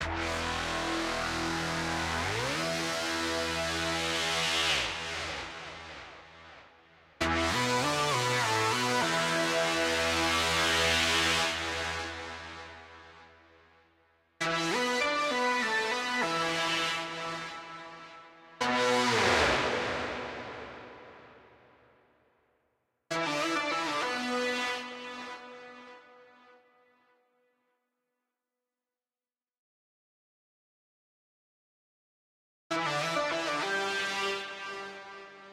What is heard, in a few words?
Mix Stem Layer; quantum recharged gamerip 2023